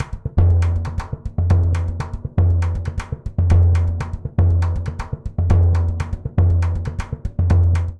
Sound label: Candombe Surdo 120bpm; Latin Percussion; Ableton; Factory Packs